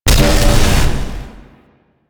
Void Dragon Body Ring Impact; Enemies; Abilities; Warframe; Duviri

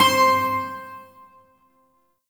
Shawzin Oct Three C; Warframe; Duviri Shawzin

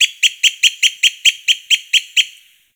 Bird Alerted Blackbird Call; Midrath Island; Wildlife

